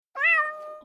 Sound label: meow, house of cat